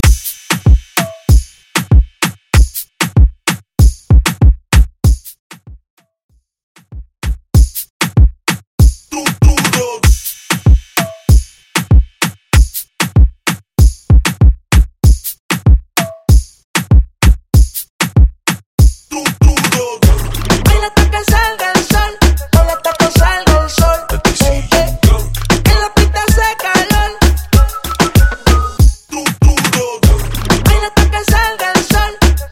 Top40 Urban Latino

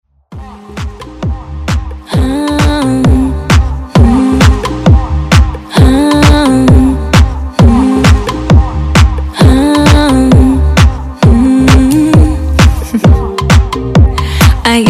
Latin House Redrum

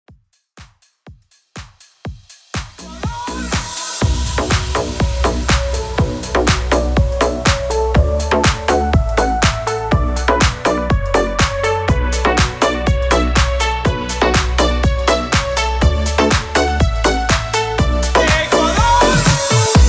HOUSE MIX 122 BPM

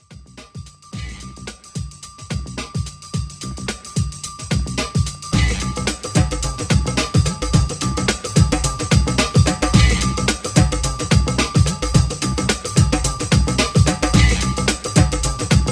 Bhangra 90s Dance Re Drum 109 CLEAN

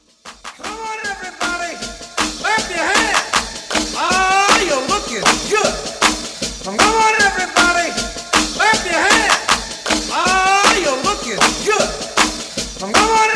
60s Clapapella In Out 156 BPM

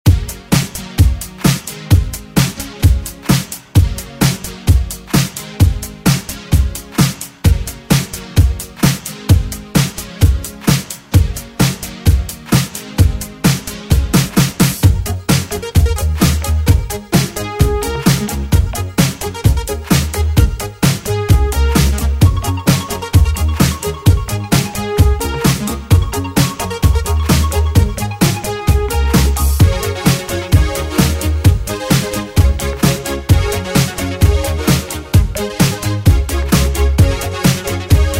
Oldschool Redrum; Deejay Hit

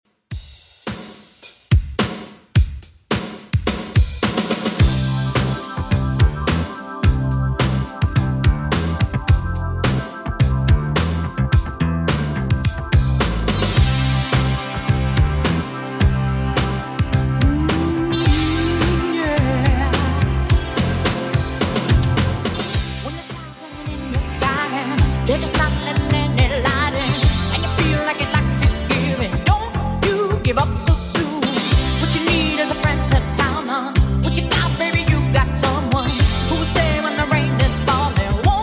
80s Redrum 107bpm

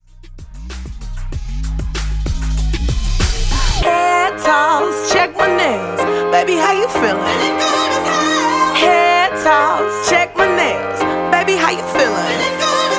Top 40; Remixfreakz

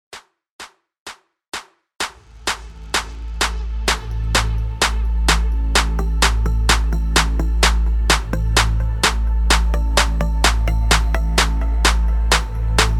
PARTY STARTER 128 BPM

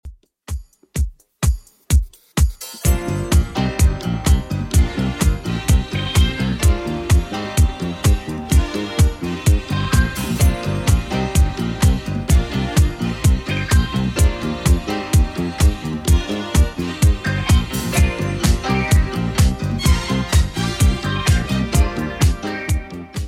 70s Redrum 127bpm

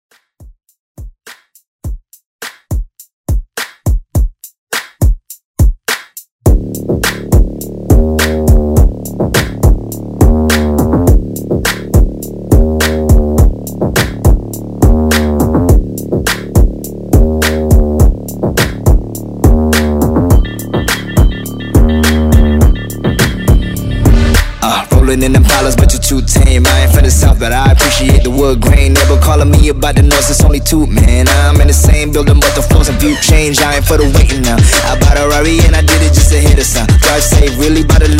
Redrum

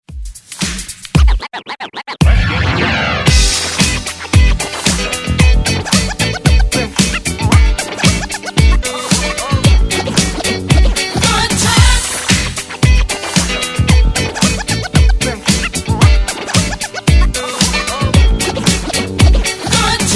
Classic Rework

